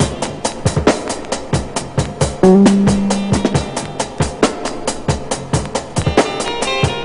68 bpm hq drum loop D key, Free breakbeat sample - kick tuned to the D note. Loudest frequency: 1325Hz